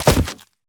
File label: Jump Dry Bushes Grass Thatch Foley